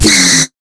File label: TECH 140 FX, Big Beat Drumloops